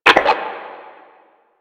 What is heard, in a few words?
Static; Warframe; Lasrian; Lasrian Enemy B